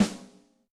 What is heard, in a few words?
Ambient, Yamaha DTXpress-II snare